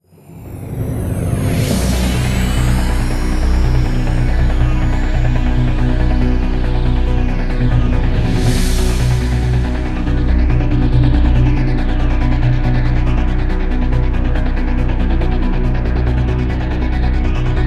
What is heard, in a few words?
Combat (Low); Mass Effect 2; Collectors' Base